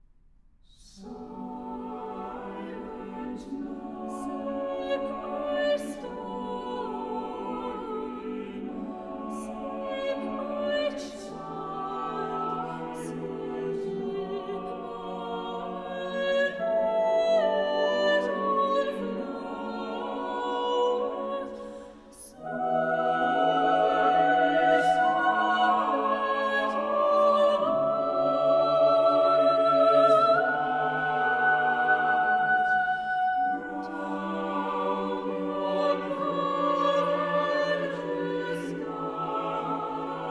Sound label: Mary's Lullaby